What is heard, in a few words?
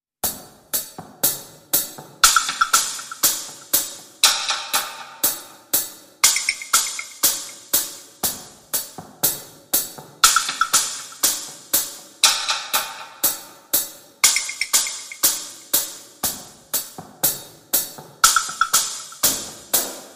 Wood, Hits Only